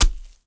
knife stab; weapons

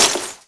Glass Smash Small, Explosions

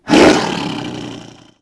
snap, Dino

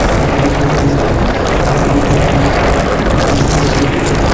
roll conc painted; terrains; Tony Hawk's Project 8; XBOX

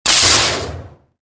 saber block; weapons

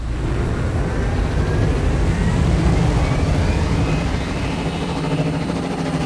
Ship start, vehicles

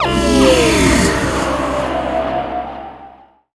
Game Fail

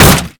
Sweetner Metal, impacts; Totaled; XBOX

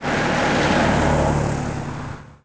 Ambient Car Whoosh; Cars; XBOX

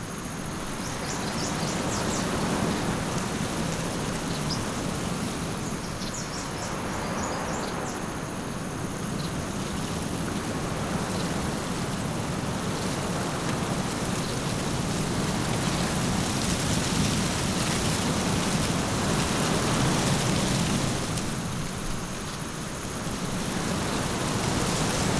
ambience; Street Hoops; XBOX

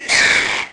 Arachnid Attack, Aliens

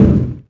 ramp hit, impact; Splashdown; XBOX

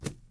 hand Slap grass

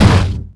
footstep; galak

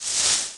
Scrape, Small Spearleg, Aliens

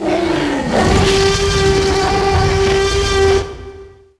Trex Roar

